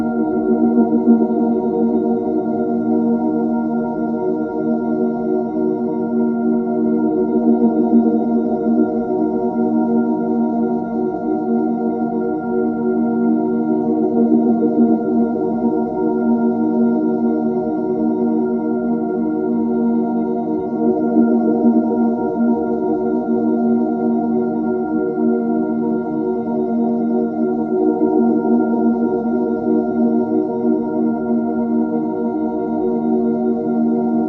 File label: prison track pads